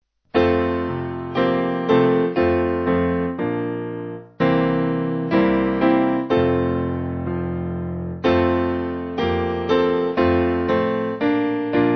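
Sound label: Piano, Music Backing Files for Churches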